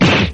regular kick

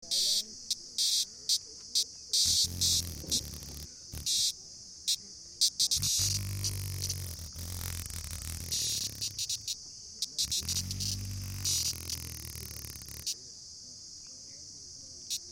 insect superfast wing flap

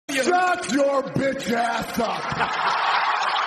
rock meme; sybau meme

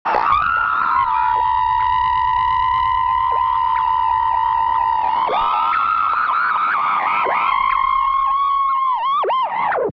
bad radio frequency connection

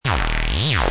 bass glitch

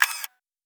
camera lens shutter

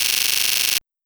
short electric fence buzz

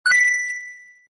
coin recieved

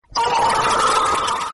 emoji scream

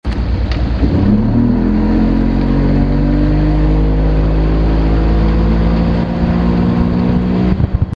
motorboat accelerates

